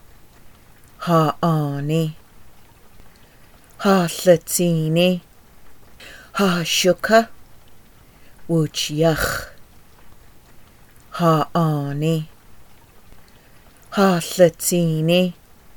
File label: chorus